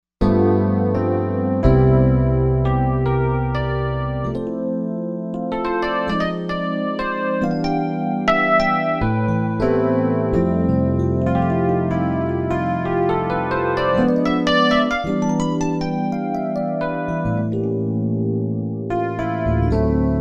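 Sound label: piano Rhodes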